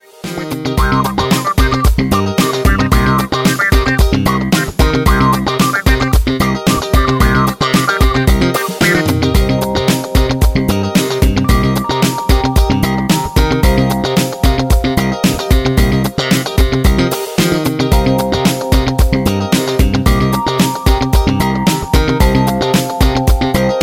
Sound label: Funky Rhythm Catwalk Show